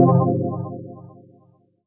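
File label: Fm chord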